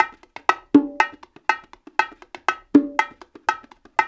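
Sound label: 120 bpm, tonga; Spectrasonics; Supreme Beats; African Contemporary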